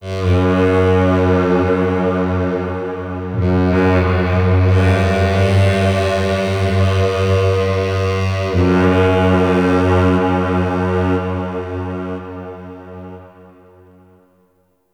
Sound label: Castle Distant Dark Horn; Ambience; Midrath Island